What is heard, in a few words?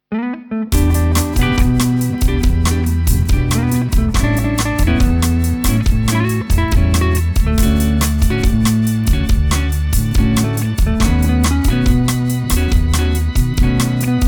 ELEVATOR